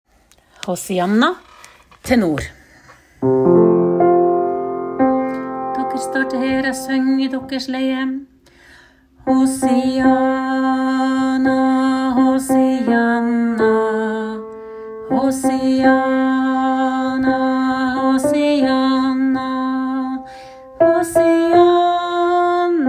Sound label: tenor; Hosianna